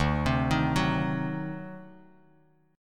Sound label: D 7th Flat 9th D down Bass Standard; D7b9 arpeggiated; JGuitar